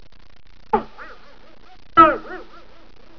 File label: boing